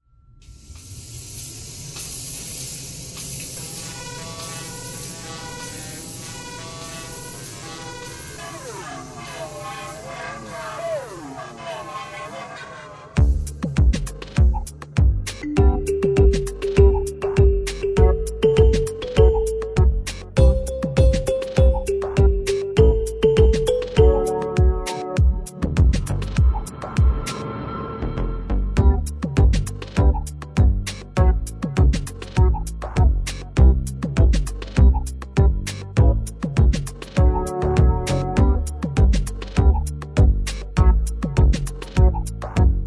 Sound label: Karaoke